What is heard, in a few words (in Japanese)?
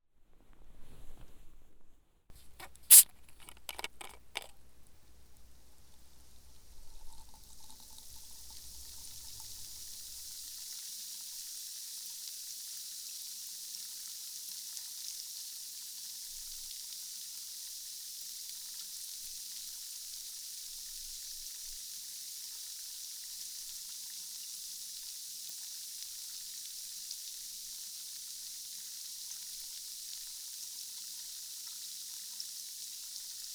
シュワシュワ 炭酸 ASMR